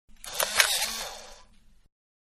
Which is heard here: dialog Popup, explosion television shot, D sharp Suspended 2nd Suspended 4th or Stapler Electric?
Stapler Electric